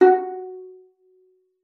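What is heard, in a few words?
violin pizzicato F#5; Xsample VOL-5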